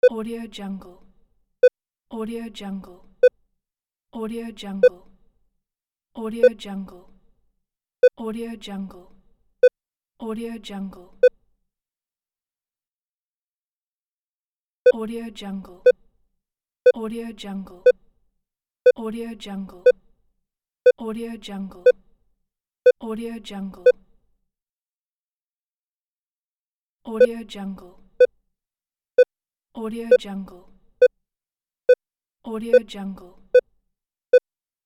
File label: heart rate monitor; juicycorp